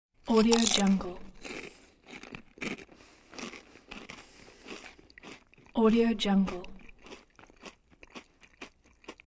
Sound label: chew chips